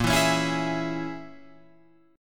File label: A sharp Minor 7th Flat 5th, Guitar, Open F; strummed; 10,11,13,11,11,11; JGuitar